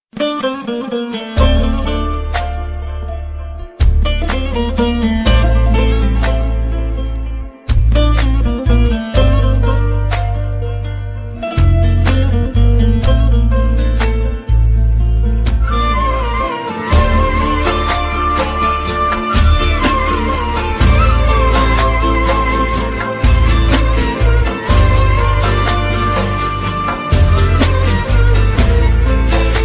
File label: saz music